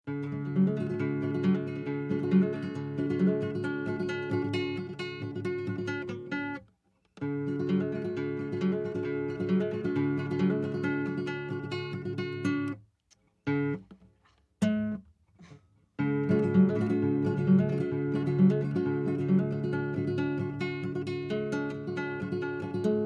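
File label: Acoustic Guitar Practic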